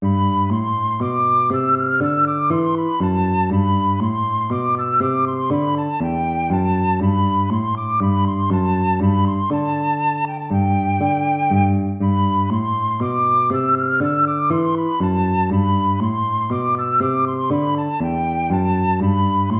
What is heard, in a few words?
baroque minuet major